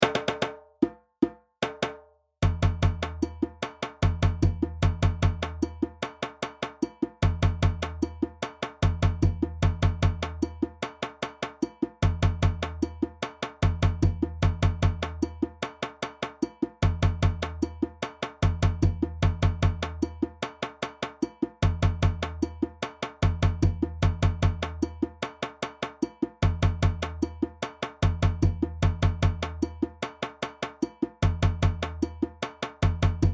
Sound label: Doundoumba + Djembe; Djembe 1; Sorsonet